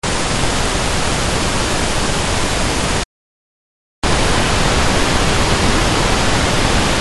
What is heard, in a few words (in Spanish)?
Ruido Rosa; sensibilidad a frecuencia y amplitud